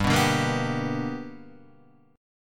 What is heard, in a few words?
B Minor Major 7th Sharp 5th